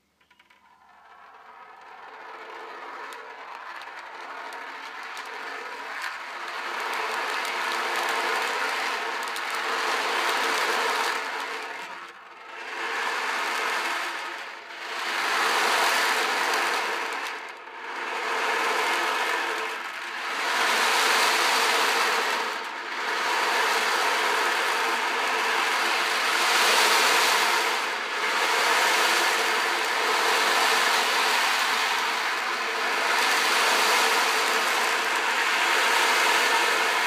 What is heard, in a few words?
Ocean Drum